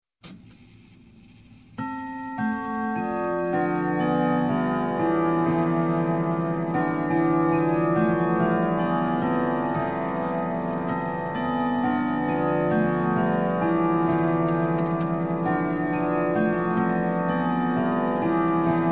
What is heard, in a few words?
Clock, Grandfather Whittington Chime - Strike; Sound Ideas 2000 Series; Strike Nine O'cloc